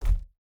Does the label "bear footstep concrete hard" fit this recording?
Yes